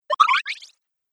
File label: Arcade Game Liquid Bleeps; Video Game Sound Effects; Retro Inspired Sound Effects - Think Nintendo, Atari, C64, Etc.; Andrew Fleming